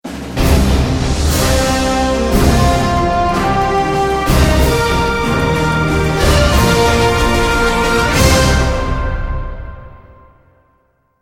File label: round mvp anthem, music; Counter-Strike Global Offensive